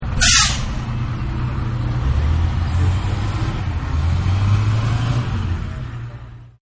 Bus Engine Purring, Bus Door Closes And Drives Off With Heavy Rumbling; Exterior Perspective